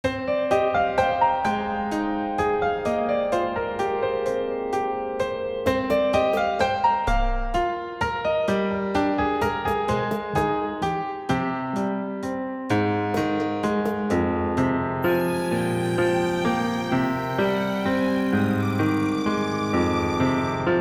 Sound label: Log In; Initial 2 New Stage